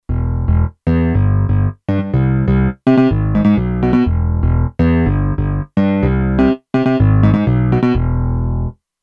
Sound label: Bass, Kurzweil K2500X; Factory Programs